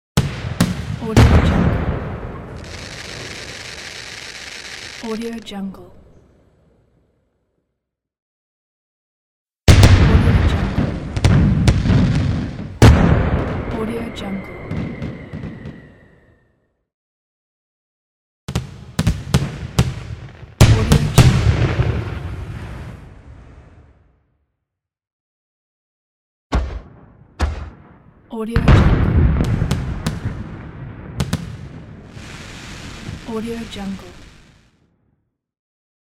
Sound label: fireworks